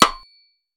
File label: Rimshots